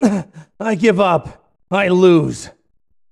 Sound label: death; goku